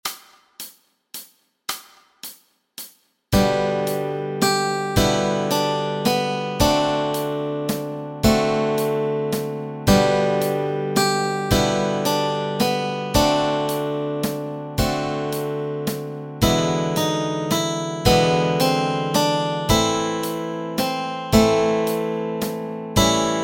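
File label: melody and chords, Easy Guitar and Ukulele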